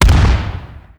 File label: explosion weak